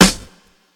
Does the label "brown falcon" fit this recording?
No